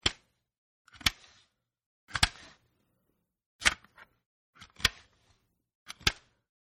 Cd Dvd Regular Fat Non-Slim Jewel Case Open-6 Takes; Snaps; Snap Sound Effects; Objects